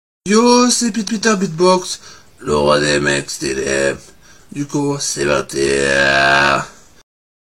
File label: beat box